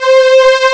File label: lead brass; Amiga Soundtracker Sample Packs